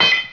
pot lid

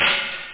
open; OctaMED SoundStudio V1; drums misc

